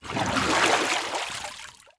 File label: Water Flower Canister Scoop Up Water, Household